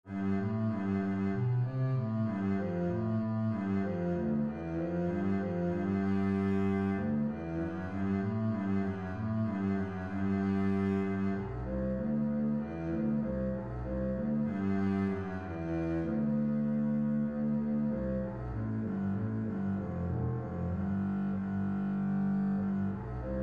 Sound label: bass, string bass; Columba